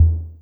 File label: Sequencing Bass; Kit; Feel; MMFeedbacks; SequencingDemo